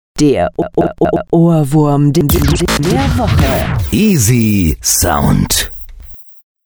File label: jingle; easysound